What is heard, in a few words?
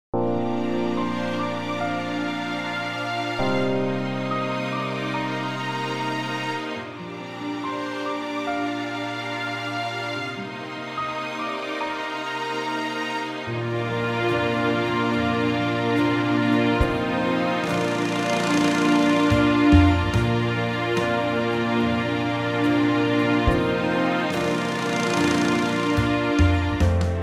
Karaoke